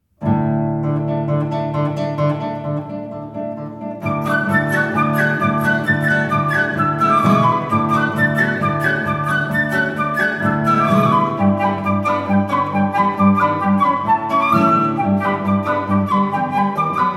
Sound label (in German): Gitarre Floete; Gitarre und Flöte: Bartok